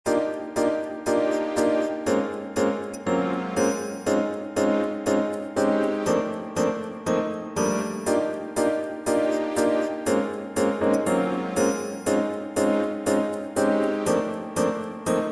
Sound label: Impatience; Nakano